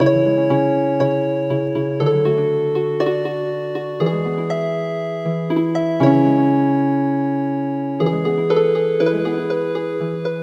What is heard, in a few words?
Healin Harp